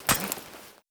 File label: hit, knife; weapons; cw20 knife g4p